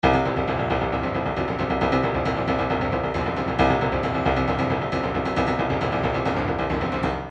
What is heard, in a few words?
trance midi loops bass; newtronic trance constuction kit